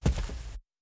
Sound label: walk carpet; lucius